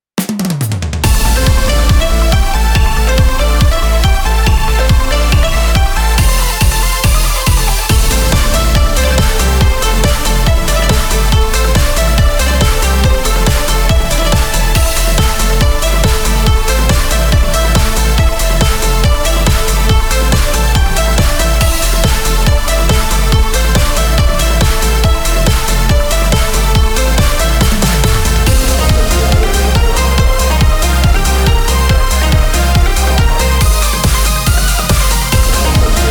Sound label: EDM 140